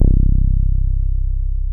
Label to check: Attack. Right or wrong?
Wrong